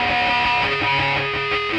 FX Guitar

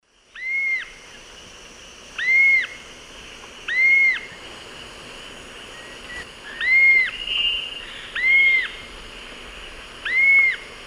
Galbula chalcothorax, calls pair; S. America; Eastern Lowlands

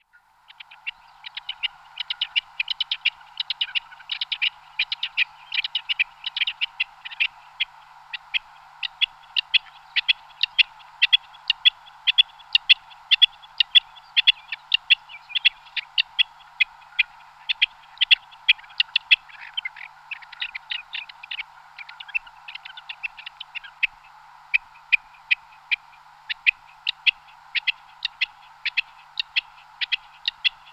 Alectoris graeca; All the bird songs of Britain and Europe; Jean-Claude Roche

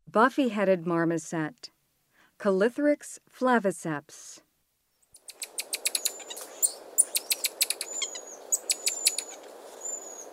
Buffy-headed marmoset; S. America; Rainforest; Primates of the Rainforest

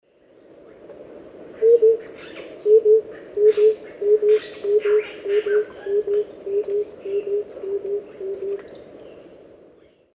Capito auratus, song; S. America; Eastern Lowlands

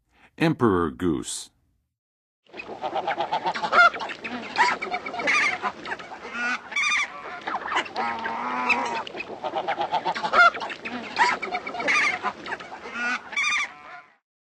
Emperor Goose; North America; Western USA; Western USA Songbirds

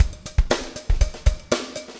breakout 120, 120bpm loops

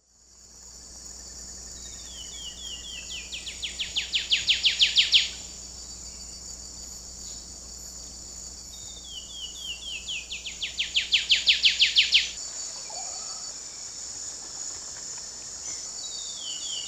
Basileuterus fulvicauda, song; S. America; Eastern Lowlands

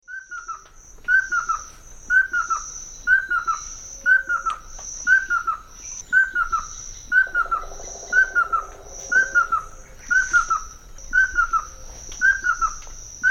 Anurolimnas castaneiceps, song; S. America; Eastern Lowlands

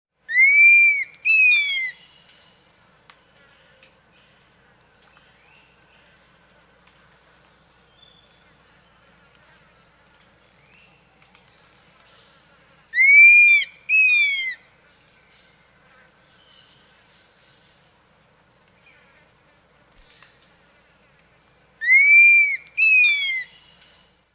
Call, Birds of the Costa Rican Cloud Forest

